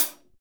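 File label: hi-hat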